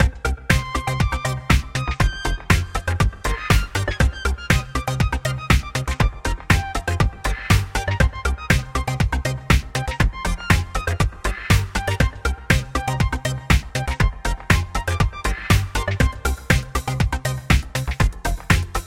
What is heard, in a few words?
DANCE POP ELECTRO 70s EURO ITALIO; Gravity Falls Season 1 - Soundtrack Excerpts Vol. 2